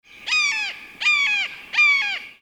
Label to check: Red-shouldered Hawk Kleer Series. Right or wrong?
Right